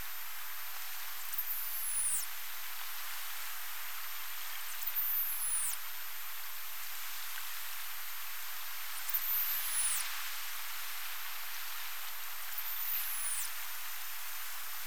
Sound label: Pseudorca crassidens Whistle Train